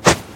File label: stone axe swing